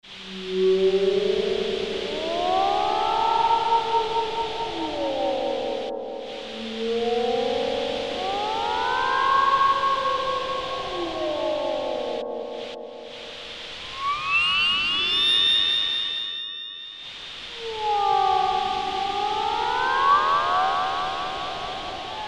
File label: Strange Cries; Green Oak Crystal